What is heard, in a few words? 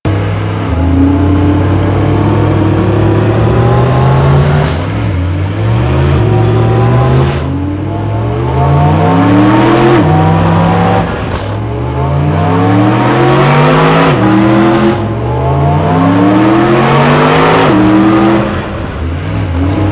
enemy truck loop; Warframe; NineteenNinetyNine; Stage8ShitboxConvoy